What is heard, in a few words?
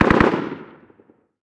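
gun m240 distant; Call of Duty Modern Warfare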